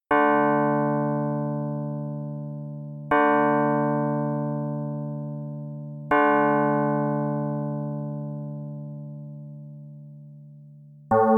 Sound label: dissonan